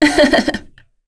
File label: Vox Laugh; kingsraid; voices heroes en